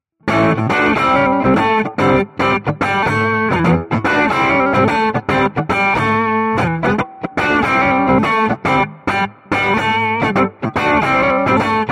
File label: Bartender Crunch Reverb; Audified ampLion 2